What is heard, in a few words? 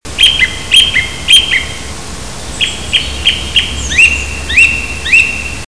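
pevchiy drozd golos, song thrush voice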